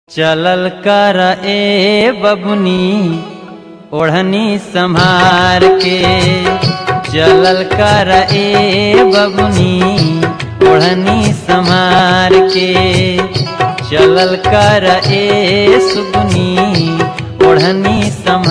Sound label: BHOJPURI RINGTONE